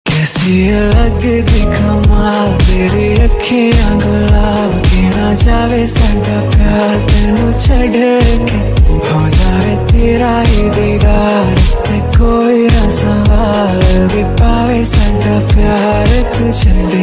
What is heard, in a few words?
song ringtone